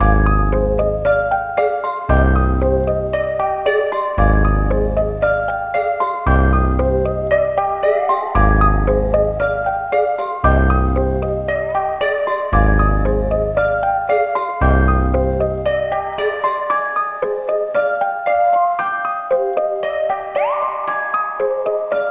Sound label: 115 BPM C Min, Melodies